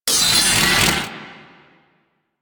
Turret Ready Generic; Warframe; Zariman

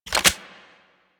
Auto Crossbow Reload Clip In; Warframe; Tenno

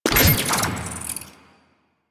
Moa Summon; Warframe; Quests; The New War; Veso

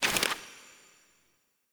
Collectable Note Pickup; Objects; Pickups

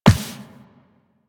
Walk Default, Footsteps; Warframe; Operator

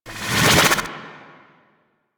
roulette deactivate; Warframe; Cowgirl

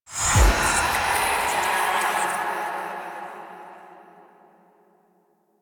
Hand Compass Flying Start; Warframe; Duviri

